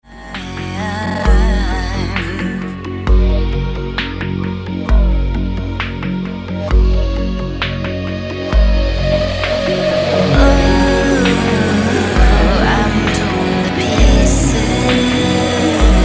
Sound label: shooting laser beams